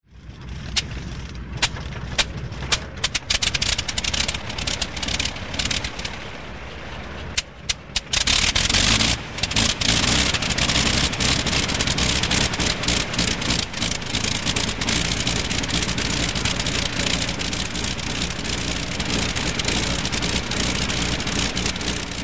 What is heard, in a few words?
fokker start